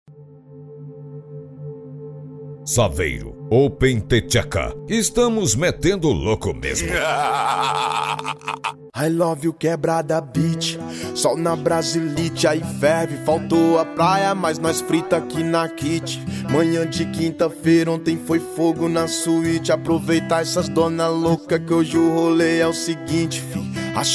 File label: bass; saveiro open the theca